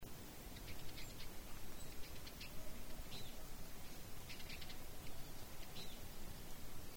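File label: mahiwa call, Eurasian siskin; jinaki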